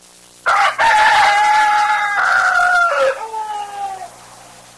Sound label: rooster